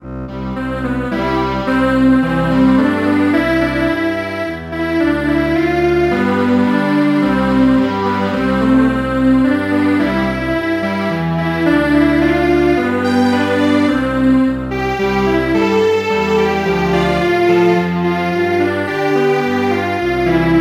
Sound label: Strings Quartet